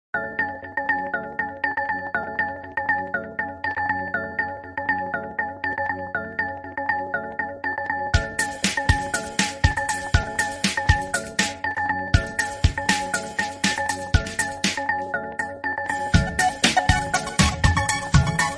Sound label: ringtone; ericsson